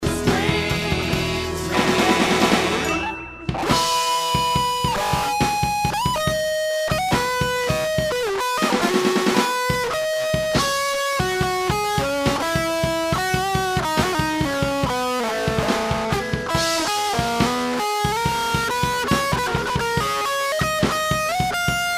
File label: guitar practice